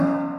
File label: Game Show Sound